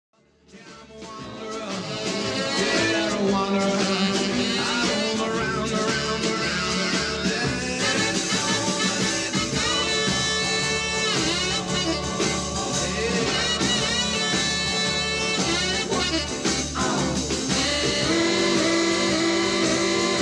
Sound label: sax solos